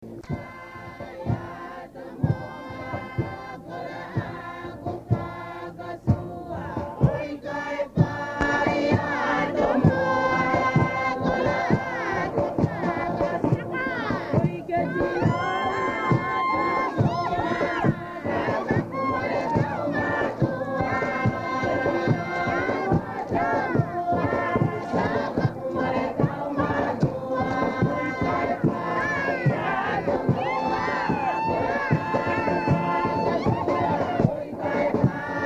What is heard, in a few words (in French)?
choeurs, wallis futuna; sigave